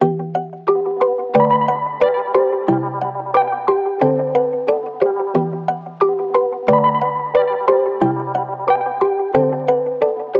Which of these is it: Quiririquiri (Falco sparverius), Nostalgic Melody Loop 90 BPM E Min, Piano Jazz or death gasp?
Nostalgic Melody Loop 90 BPM E Min